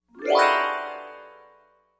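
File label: Music Transition Vibraphone; mallets vibraphone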